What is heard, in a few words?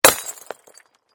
Glass Break Lightbulb, Light bulb breaks; Light Bulb Shatters; Materials